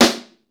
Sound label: snare, Roland; Club-50 - Foundations Roland; Ext.Snare 2